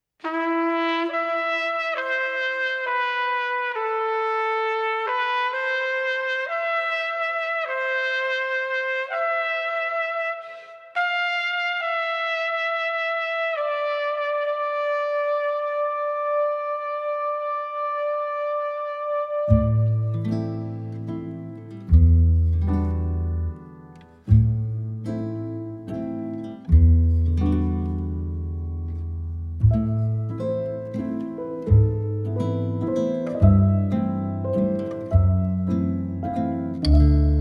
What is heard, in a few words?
waltz Klavier